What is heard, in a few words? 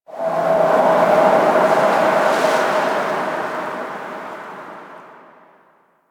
Exterior Forest Wind; Warframe; Ambience; Duviri